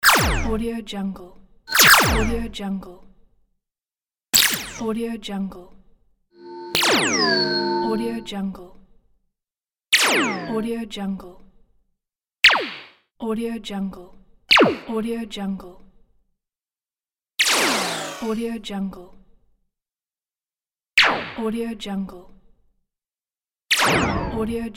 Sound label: video game space gun pack